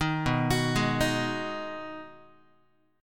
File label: B 6th B down Guitar 4A 4E 5A 5D 5G sharp 6C sharp; arpeggiated